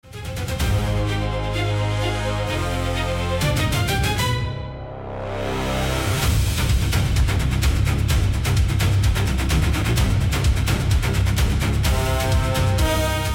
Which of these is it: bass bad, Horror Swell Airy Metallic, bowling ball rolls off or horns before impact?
horns before impact